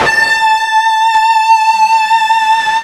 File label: FUZZ A 4, ULTRA FUZZ, Guitar; East West; Steve Stevens Guitar Samples Collection